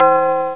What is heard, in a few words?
Tubular Bells (C3); SoundMusicSys; Simple MIDI Player